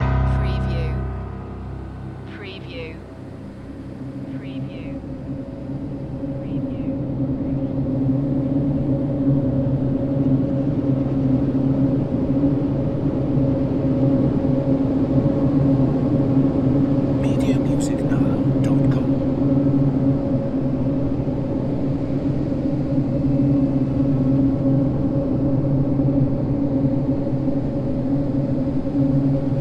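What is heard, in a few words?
Production Element Bed Piano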